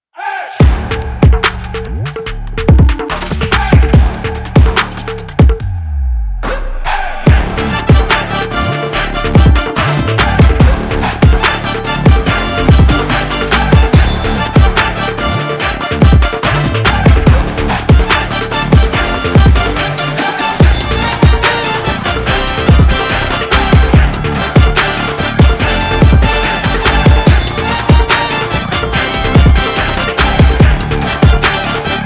Drill Instrumental; Jailer; rajini the jailer 2023

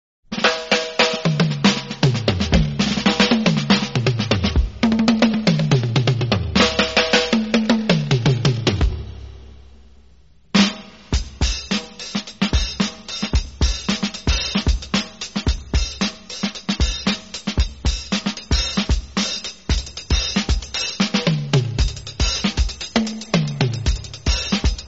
Drum Music; chor pe mor 1990

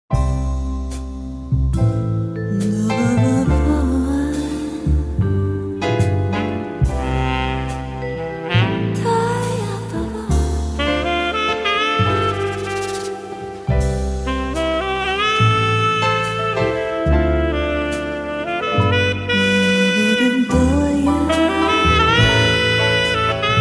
Jazz Instrumental; black 2005

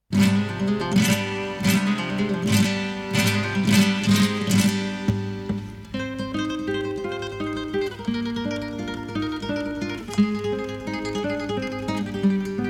Indrajeet Dance Music

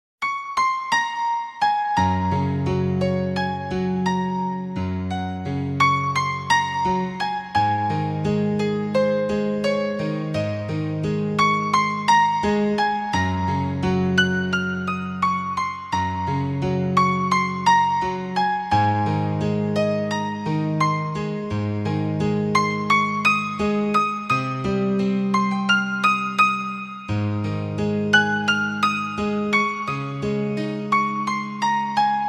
Instrumental; main aisa hi hoon 2005

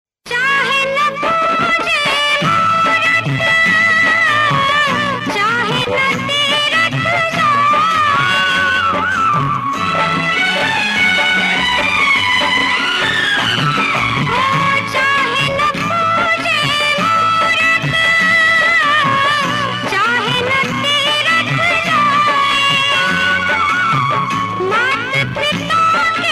Sad Version Female Version; Jaisi Karni Waisi Bharni

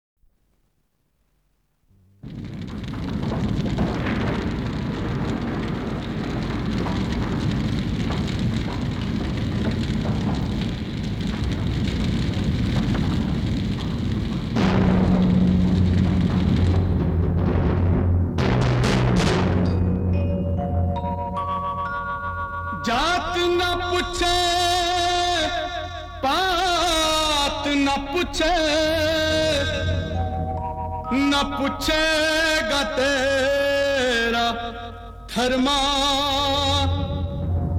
Theme Instrumental; Karma

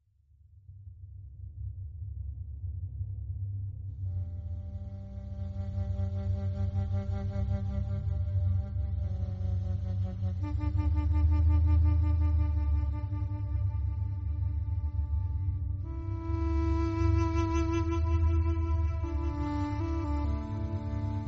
Theme Instrumental

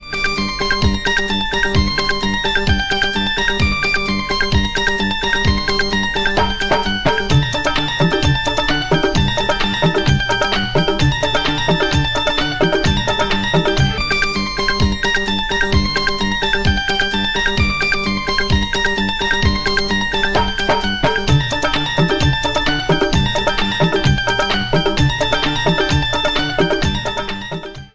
THEME; HUM APKE HAIN KAUN; hum aapke hain koun 1994

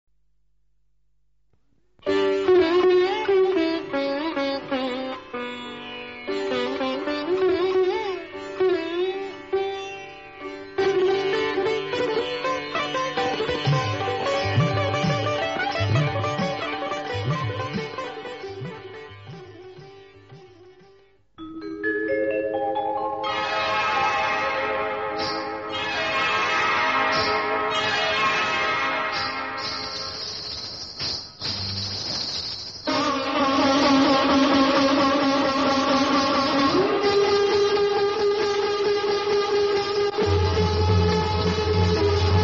Instrumental; Asha Jyoti

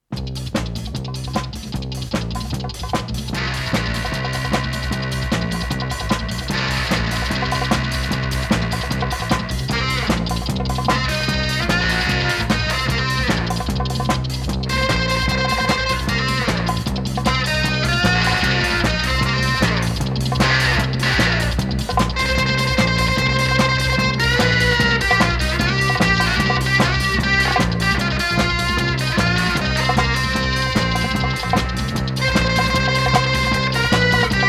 Theme Instrumental; Suraag

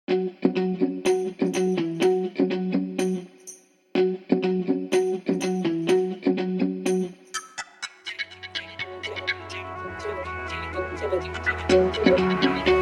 Instrumental; shuddh desi romance 2013

